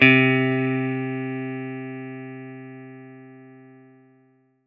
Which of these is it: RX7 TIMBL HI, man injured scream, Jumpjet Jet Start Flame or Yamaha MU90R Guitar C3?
Yamaha MU90R Guitar C3